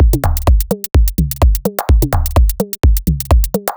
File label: Further B 127bpm, House Drumloops; 90 s Sample CDs; Zero-G House Fabrik Drumloops; ZG Drumloops